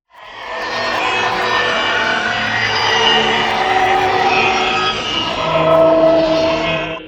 FX; LiLA'c Records PsyTrance Sounds 01

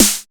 Snare

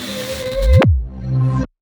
FILL, PsyTrance

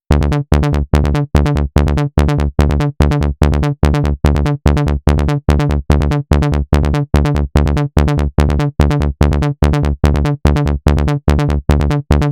Bass Fullon, PsyTrance

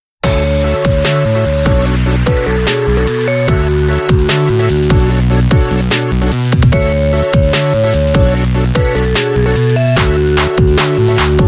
eastern laser

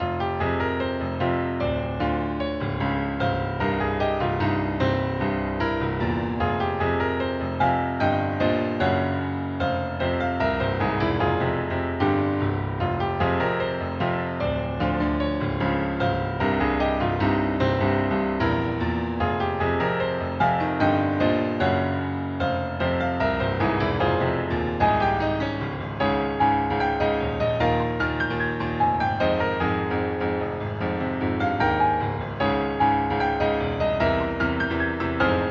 black market motif, piano

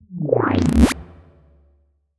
Zap, PsyTrance

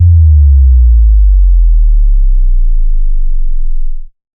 Sub Drop

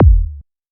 Kick; LiLA'c Records PsyTrance Sounds 01

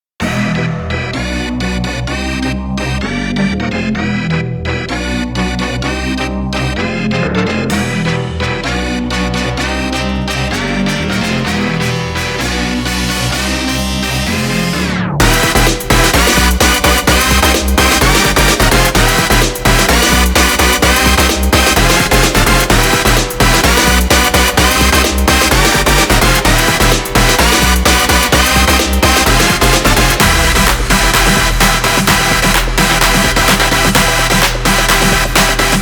Instrumental

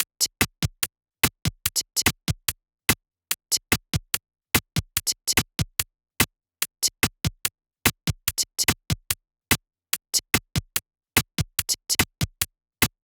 Loop, PsyTrance

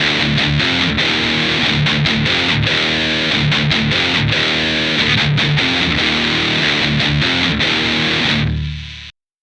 guitar; LiLA'c Records PsyTrance Sounds 01

